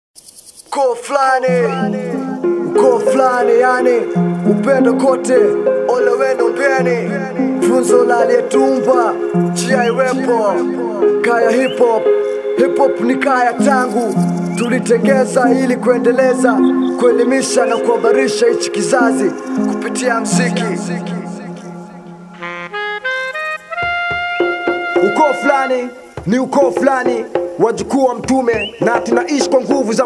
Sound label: OUTRO LOOP